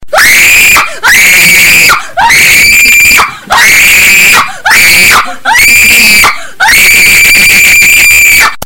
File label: screaming lady